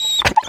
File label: injured out, breath; reviver